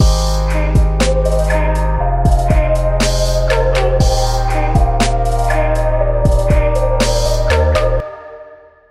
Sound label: ambiance ringtone